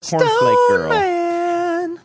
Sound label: singing